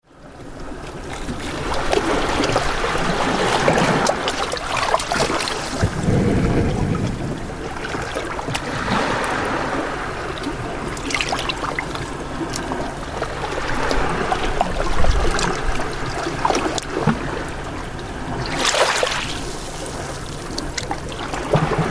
waves lapping on a driftwood beach

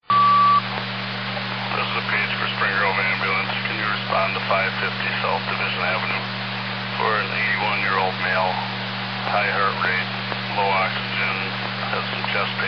ambulance page received; gold cross; osseo

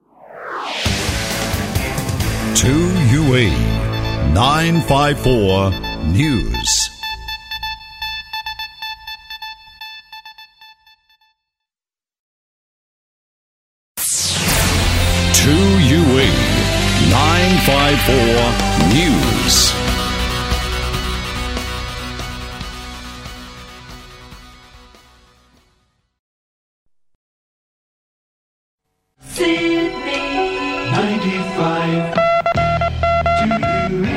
classic news themes

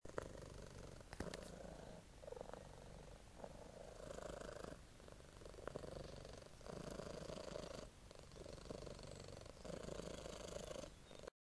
contented cat

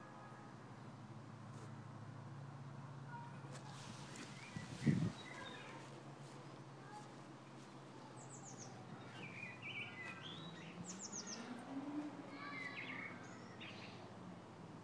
blackbird singing in garden

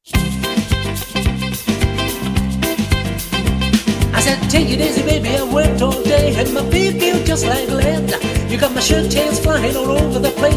sung

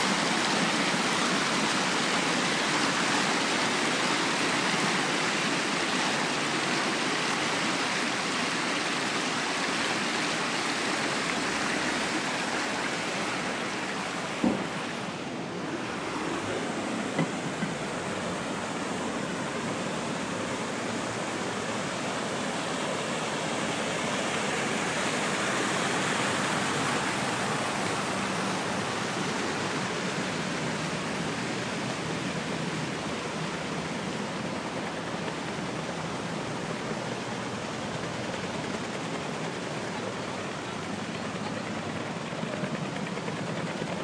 train station fountain ambience; sheffield

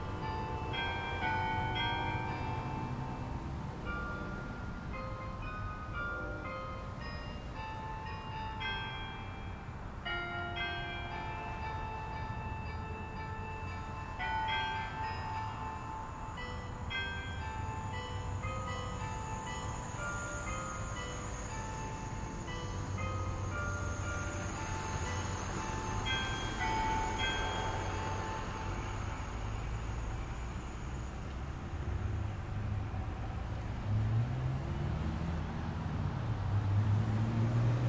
unusual church bells greek church; salt lake city

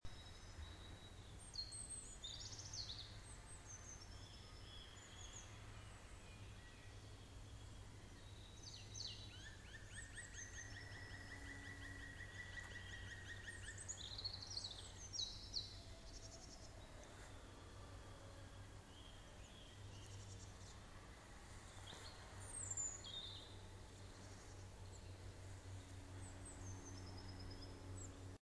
wood, erlangen germany

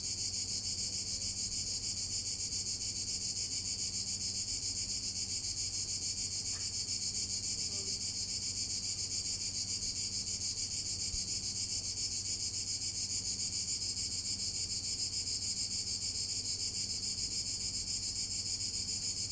noisy parrots

